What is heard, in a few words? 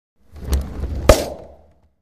Bowling Ball Rolls